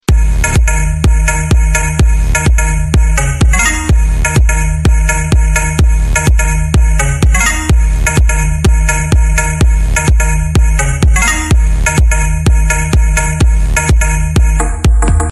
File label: Trance massage